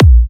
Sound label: A#, Kicks; markus hakala